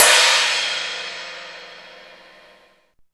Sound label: CYMBALS, Disco House; USB Soundscan vol.20 - Fresh Disco House I